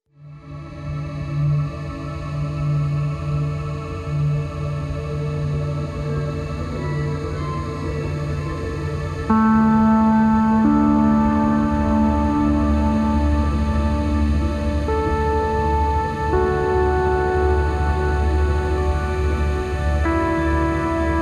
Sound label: Drone Desert Ambient; Cubic Odyssey; Xbox Series X/S